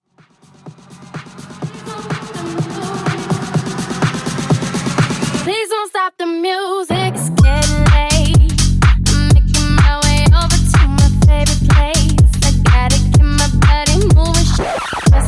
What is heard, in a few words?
Party Wordplay 125Bpm